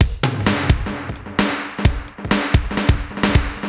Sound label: Space Echo HR-16B 130BPM